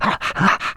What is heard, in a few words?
Frost Avalanche Pup happy